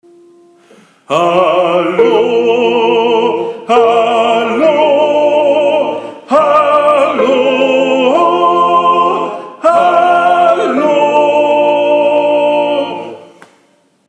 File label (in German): Hallo TENOR, Gesang